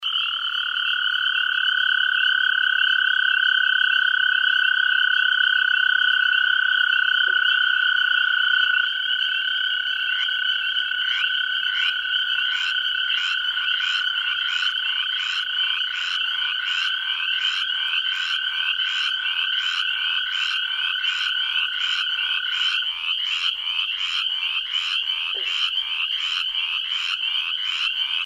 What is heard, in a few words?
Mountain Pond, toads frogs